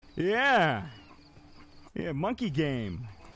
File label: Yeah Monkey